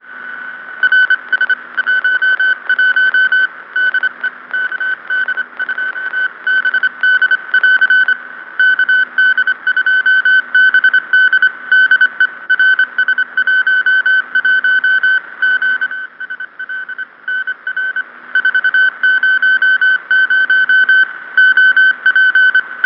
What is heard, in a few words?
robot